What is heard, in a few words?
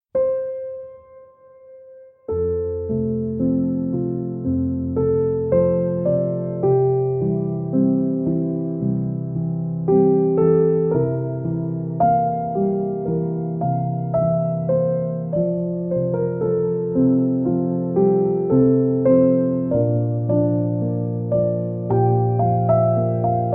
Instrumental Piano